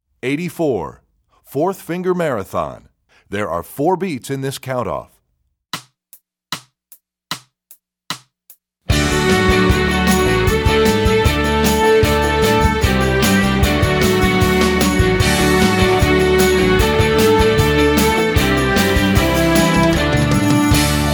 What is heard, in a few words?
4th Finger, Strings; EE2000 Book1